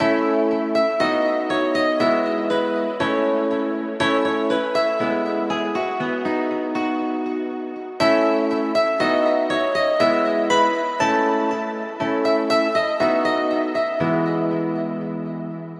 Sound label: piano; galaxy s8